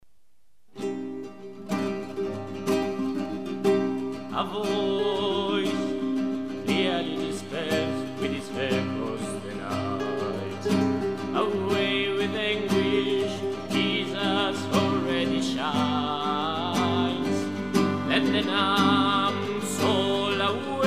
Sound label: Hymn of Advent